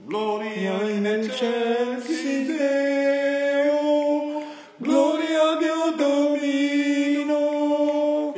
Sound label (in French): gloria, ténor